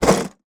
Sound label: foley box of christmas decorations down on floor; zapsplat